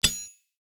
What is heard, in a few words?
Knife Clash; soundscrate